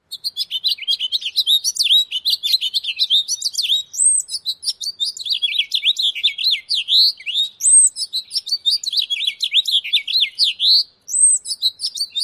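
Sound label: extended courtship song, bird; NY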